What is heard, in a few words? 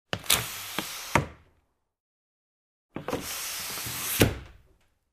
Bus window open, close; windows